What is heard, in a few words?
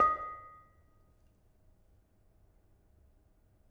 Bonang Panerus, gamelan